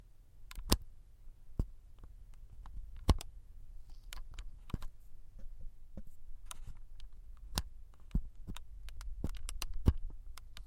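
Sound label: mouse sounds switches on a wireless mouse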